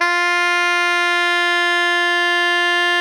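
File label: soprano saxophone F 3, fortissimo; FF; Greytsounds; Philip Wolfe David Crozier's Saxophones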